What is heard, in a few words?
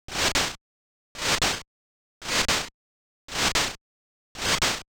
slash hit